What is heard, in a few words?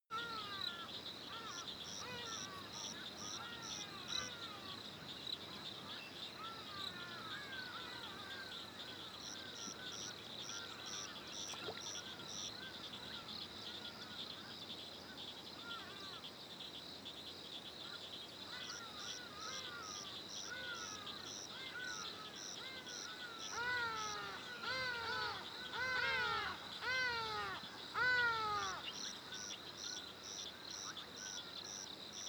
africa winter animals busy bird